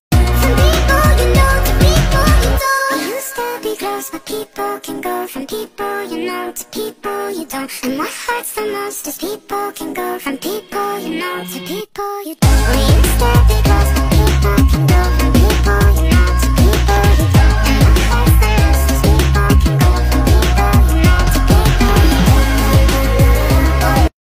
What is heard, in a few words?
speed up ringtone; english